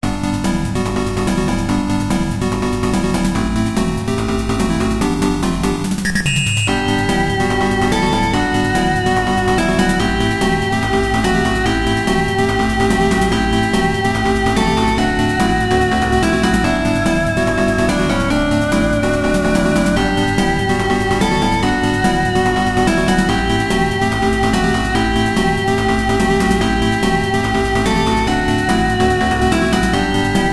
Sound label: Samba Rhythm de Cha Cha!!; Hiro's Game Music Album Selected Sorcerian